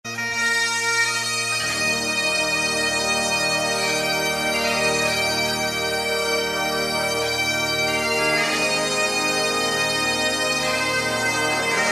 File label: bagpipe